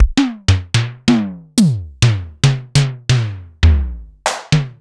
SIMMONS SD-51

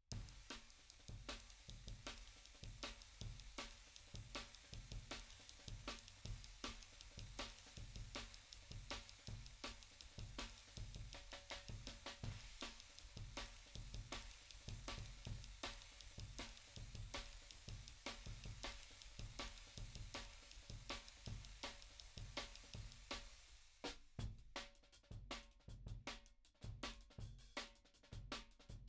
FLOOR TOM, ride cymbal; JUNGLE BEATS; THE RAIN RIDE CYMBAL; RAW MULTITRACKS

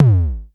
TOM, Klone Dual-Percussion-Synthesiser

